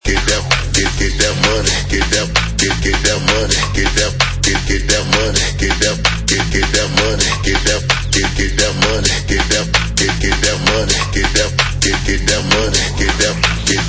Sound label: house